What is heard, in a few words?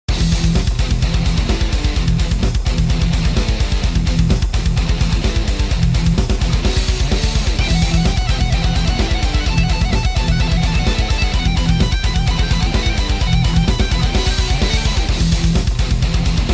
Radio Rock; Road of the Dead